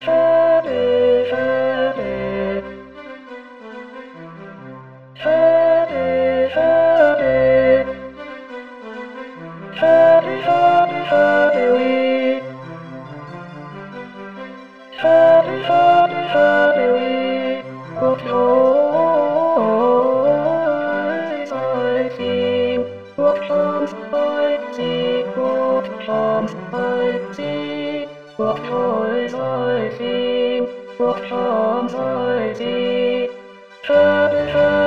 Happy we Ten

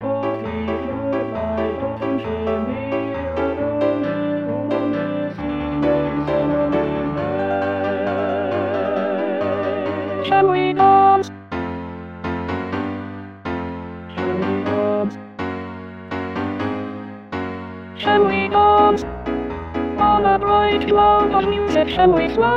Alt2; Sound of Music

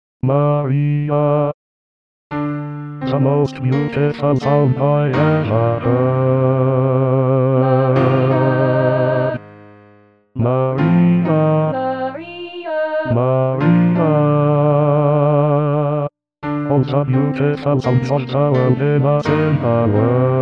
Bas

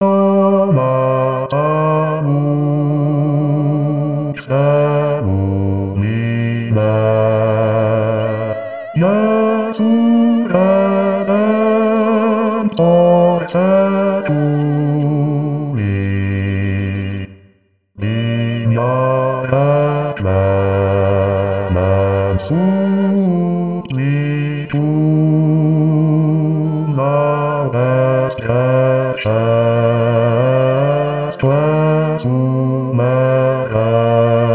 O nata lux, Bas; Tallis

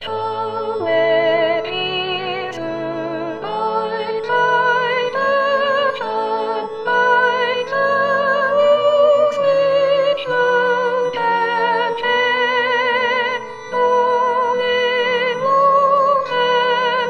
Passion Sop

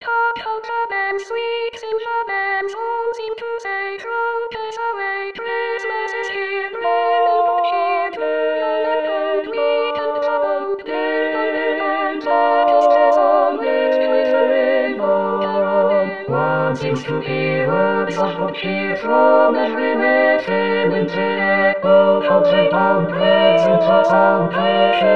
Carol of the bells All; christmas; christmas 2024

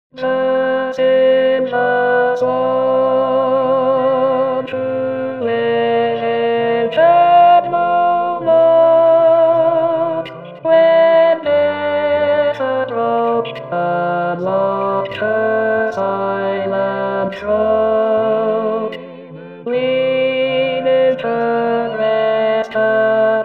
Alt2 Ten1; Silver Swan

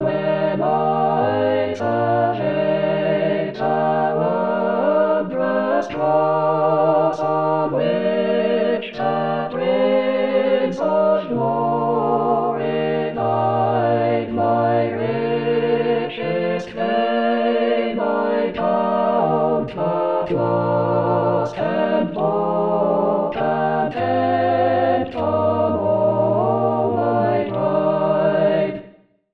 When I survey All

